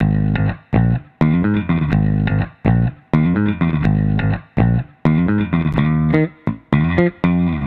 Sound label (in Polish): bas